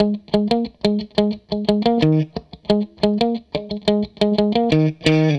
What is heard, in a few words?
Strat Guitar Loop